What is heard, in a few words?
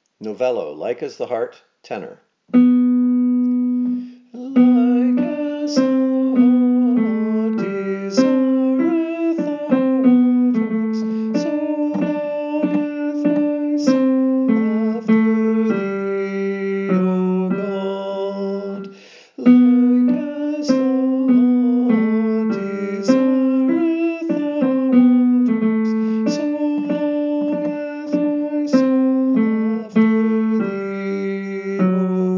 Tenor; Novello; Like as the hart